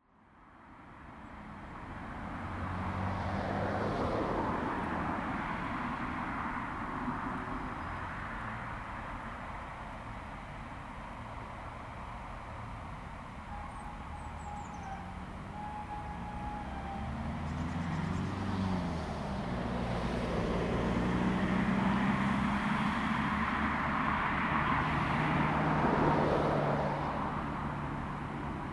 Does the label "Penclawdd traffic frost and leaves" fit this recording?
Yes